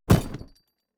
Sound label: Room Shake